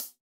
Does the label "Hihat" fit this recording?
Yes